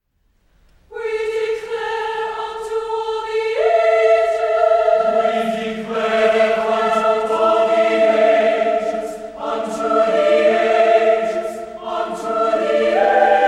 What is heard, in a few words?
Concert Choir; BYU